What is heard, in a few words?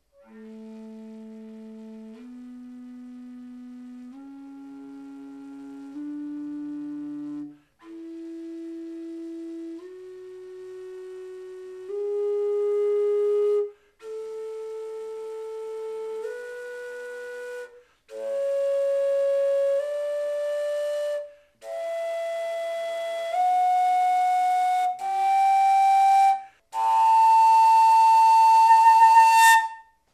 double fujara a scale; folkart slovakia